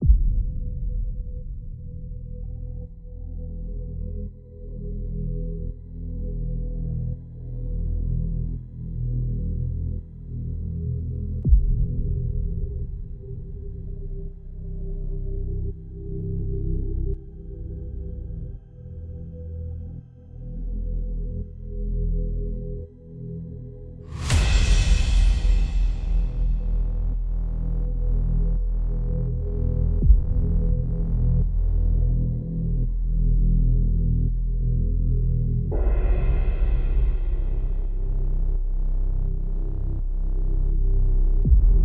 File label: Drums Mix; Anger Music Library